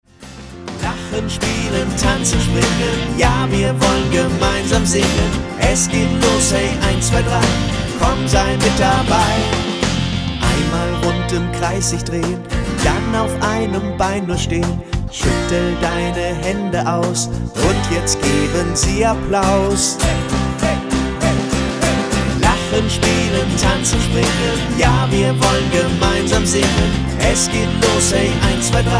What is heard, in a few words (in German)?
Lachen Mitmachkonzerte